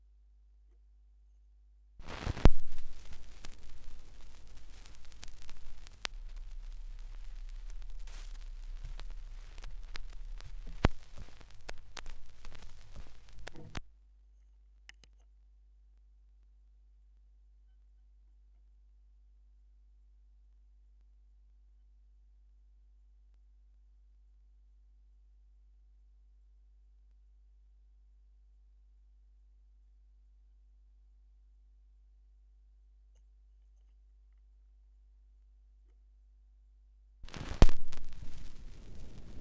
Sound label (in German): schallplattengeraeusche; rauschpartikel